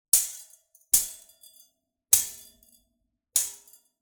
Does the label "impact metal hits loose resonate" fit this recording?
Yes